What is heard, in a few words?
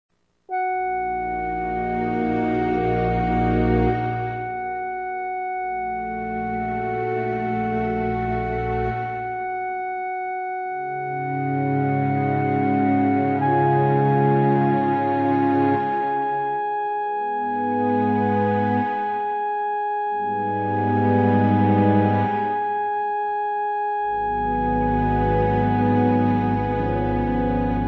score sample; Voices from the High School